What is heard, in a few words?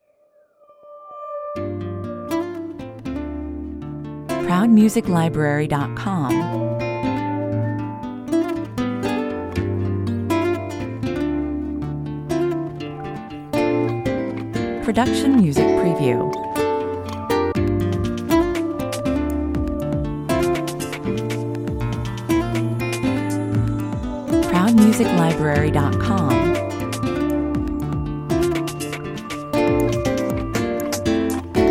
relaxing 121 bpm